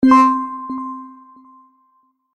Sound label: Magic Accordion Select